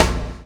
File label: CHAIN; Spectrasonics - Backbeat